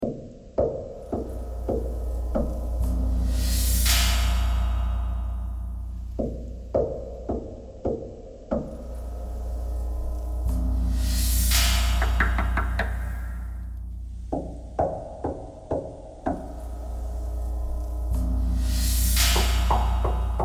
knocking thread noise

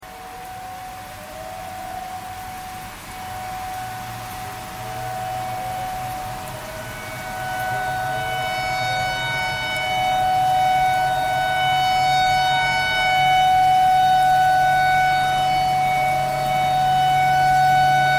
tornado siren tornado siren thread simple

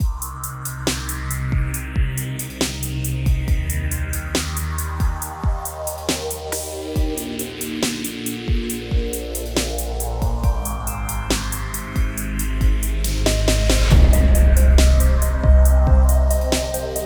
pop

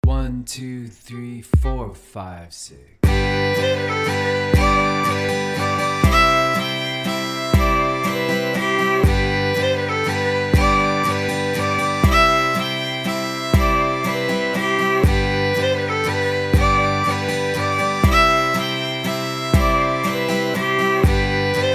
Jig Variation, fiddle; kesh

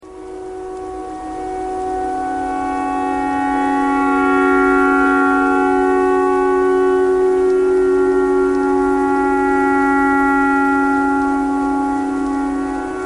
clarinet tornado siren thread pentatonic

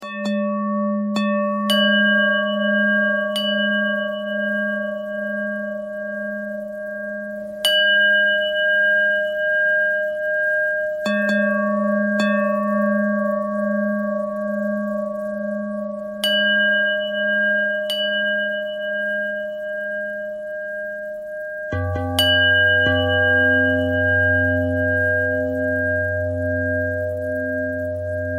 bell thread pentatonic

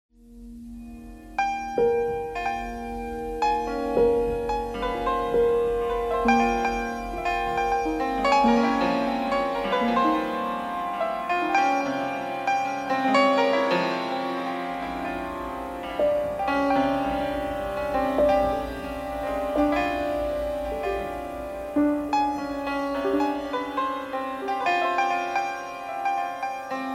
piano noise

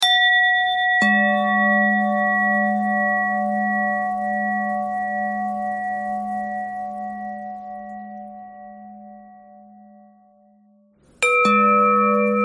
bell threads

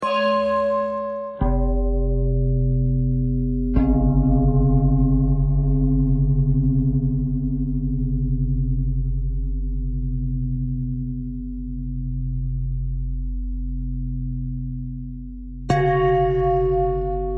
bell thread pentatonic

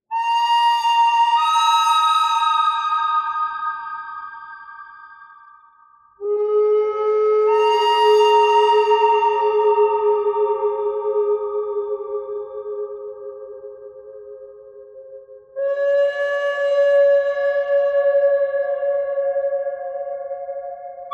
bird thread noise; birds

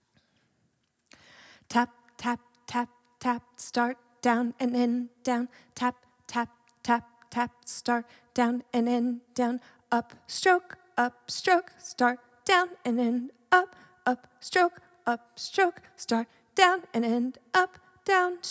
drum single stroke

